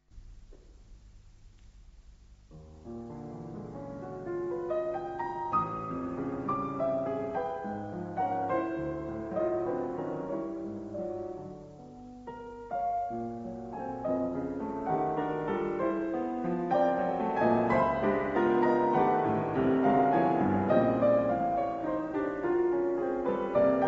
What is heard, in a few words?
Piano Duo; Night Music for Piano Duet; Anne Shasby & Richard McMahon